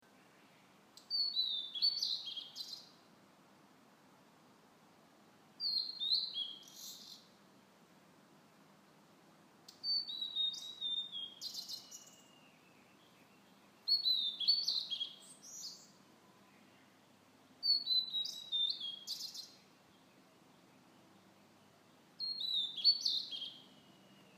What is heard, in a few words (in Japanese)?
オオルリ; フィーフィフィ、フィーチチ、最後にジジ; 森林総合研究所 多摩森林科学園